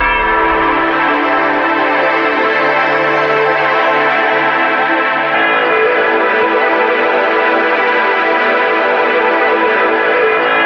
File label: seashore, loops electronic